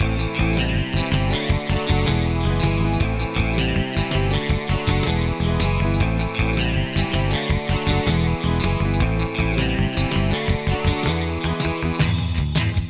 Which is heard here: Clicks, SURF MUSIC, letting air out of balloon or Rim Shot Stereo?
SURF MUSIC